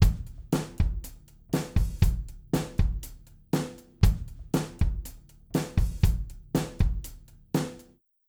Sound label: OHs Beta52 Solomon LoFReQ, bassdrum subkick; mikros